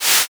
wind cut

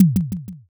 kick

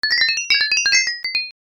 medal

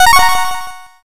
win

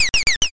bird scream

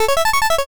arpeggio

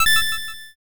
judge good

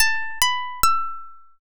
koto

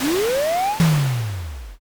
missile

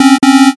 wrong

